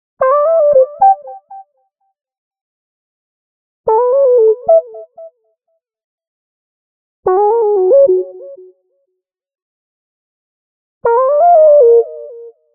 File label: Arcade Jingle Positive